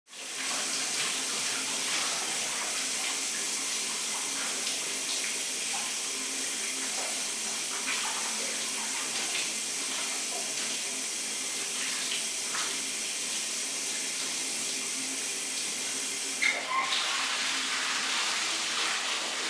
shower

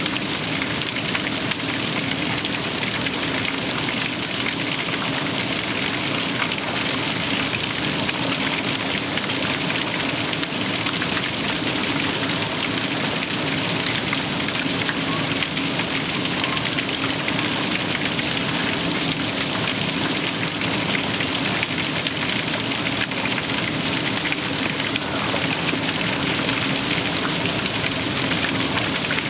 rain

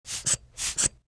air blower

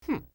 "hmm"

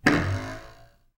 spring, mechanical sound effects

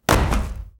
bang, mechanical sound effects

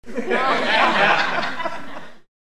people laughing

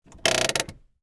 hand brake

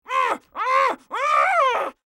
urghhh, voices

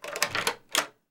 door lock

